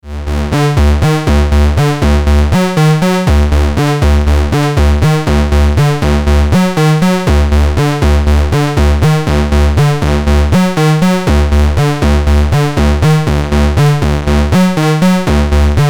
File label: Instrument In Pre Amp Tube